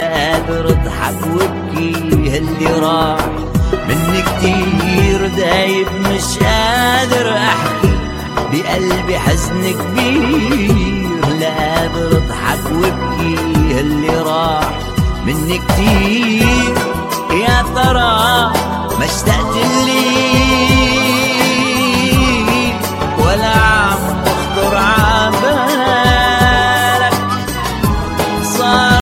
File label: arabic sad song